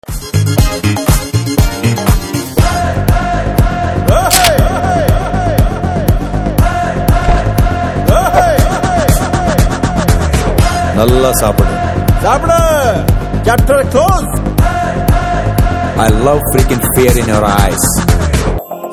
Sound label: Theme Ringtone; Disco Raja